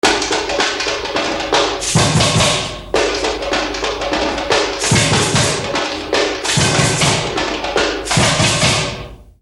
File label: break, batucada